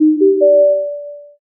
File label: success